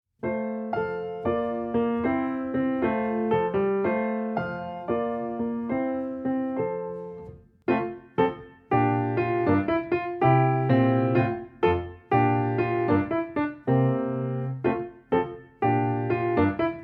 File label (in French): mouvement binaire ternaire